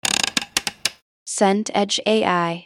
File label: screwdriver turn